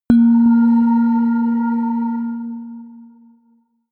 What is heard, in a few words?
melodic odd ghost bell high